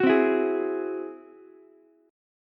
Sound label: Ab Augmented 7th Ab down Ukulele; strummed; Ab+7; JGuitar